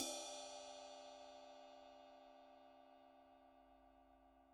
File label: Ride; DR008 Tutorial